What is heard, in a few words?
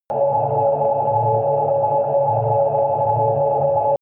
underwater noise deep